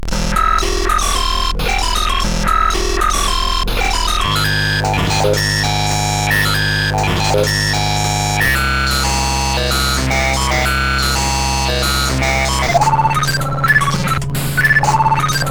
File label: Drum Controller, CSG8580R5